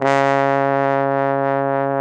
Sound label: BARITON; Best Service; Alpine Volksmusik